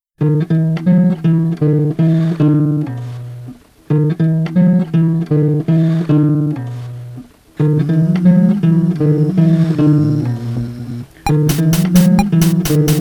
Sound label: Casio Humming